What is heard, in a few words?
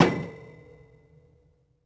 ladder; cstrike